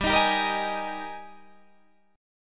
Ab Major 7th Suspended 2nd G, Guitar

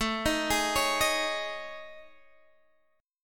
A Major 7th Flat 5th, Guitar, Open G; chord

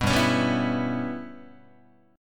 G 6th Flat 5th G, Guitar; G6b5 strummed

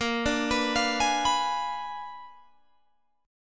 A sharp 7th Flat 9th A sharp down Guitar Open G

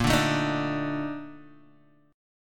Bb Major 11th, Guitar, DADGAD; 8,5,7,8,5,x

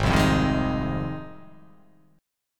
G sharp 6th G sharp down, chord

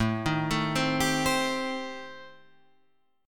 D sharp 6th Flat 5th, A

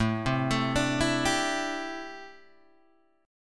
A Minor 11th A Guitar Open G

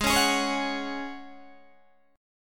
G sharp 7th Suspended 4th G sharp, Guitar DADGAD

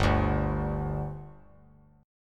G 5th G, chord; G5 strummed

